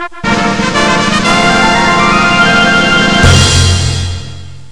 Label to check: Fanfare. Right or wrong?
Right